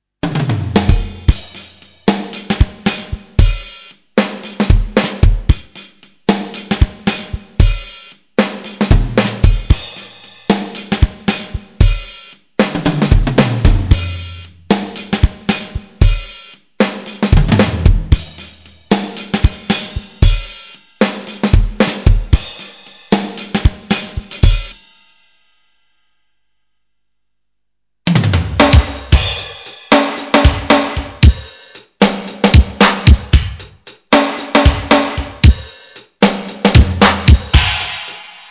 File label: Some drums